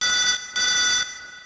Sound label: ring